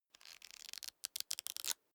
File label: small book riffle through